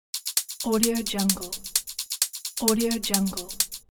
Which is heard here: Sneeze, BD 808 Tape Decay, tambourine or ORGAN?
tambourine